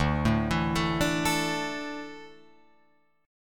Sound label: D Suspended 2nd D down Guitar Open F; arpeggiated